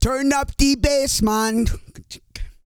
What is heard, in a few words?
REGGAE, Vocal Planet World Voices